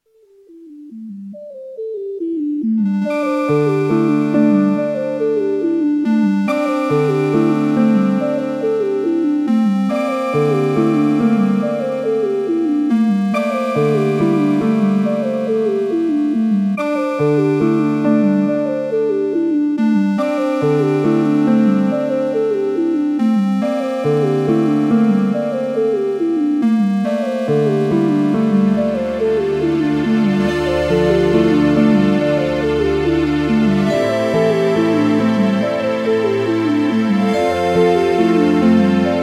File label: Intro Yamaha S-YXG100PVL